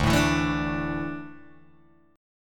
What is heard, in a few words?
D Suspended 2nd D down Guitar Open G